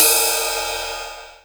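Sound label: ride, drumtraks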